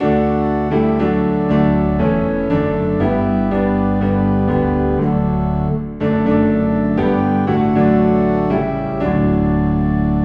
Piano and Organ Low in D; Softly and Tenderly

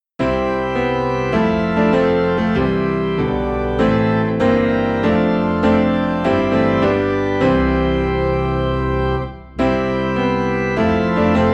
Piano and Organ Low in C; Go Tell It On the Mountain; christmas

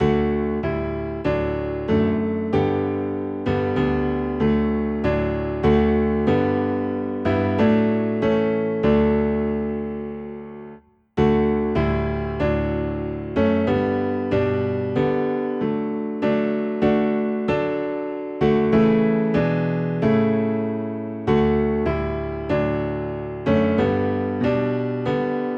Tis So Sweet to Trust in Jesus, Piano Low in D

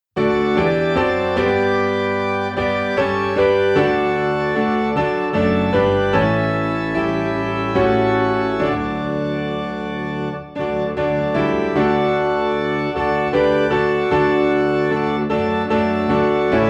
Piano and Organ in D; The Love of God

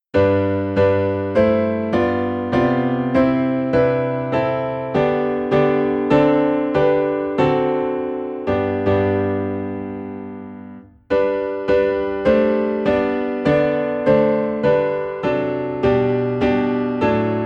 Piano in G; Joyful Joyful We Adore Thee

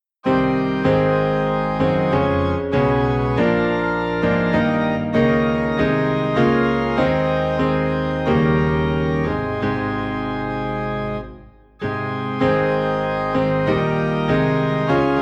piano and organ low in A flat; Almighty Father Strong to Save

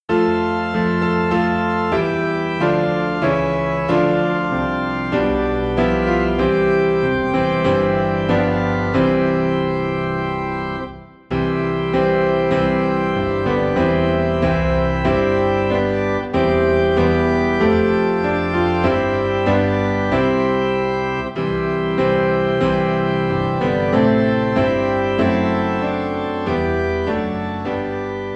Piano and Organ Low in C; Hark the Herald Angels Sing; christmas

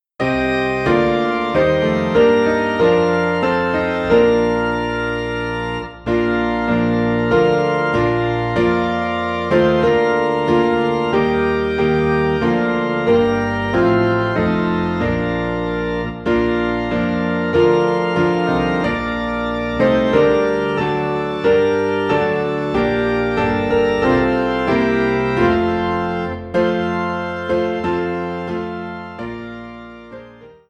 Piano and Organ in Bb; christmas; Angels From the Realms of Glory

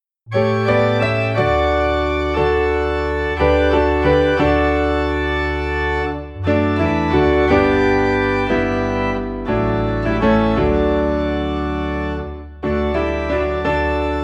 Piano and Organ in D; Blessed Assurance

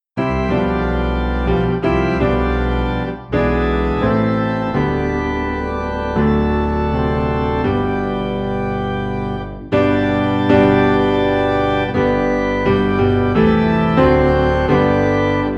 Piano and Organ Low in G

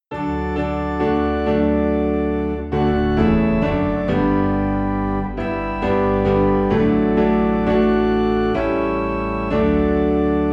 Piano and Organ Low in D; Higher Ground

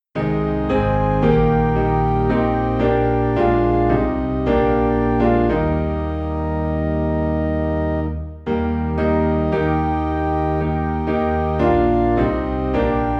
Piano and Organ in E Flat

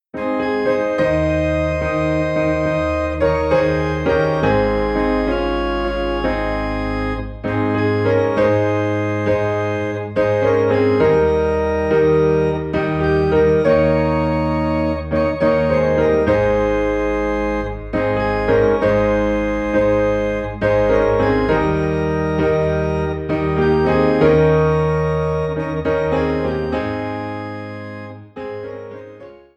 Piano and Organ in A Flat; I Will Sing of My Redeemer

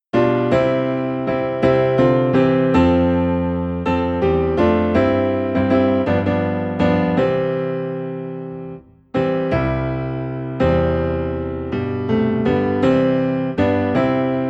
Piano Low in C, hymns; We're Marching to Zion

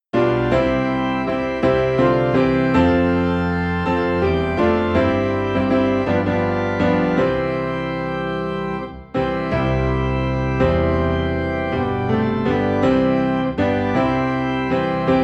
piano and organ low in C; We're Marching to Zion

